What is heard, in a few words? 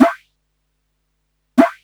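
COO snare drum, drum loop; Big Fish Audio; Uncivilised G'rooves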